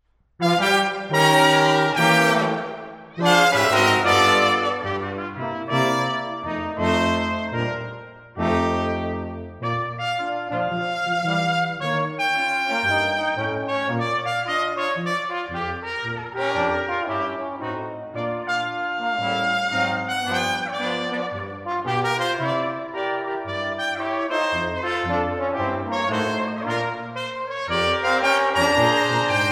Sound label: Blues, Brass Quintet; Buster Keaton Blues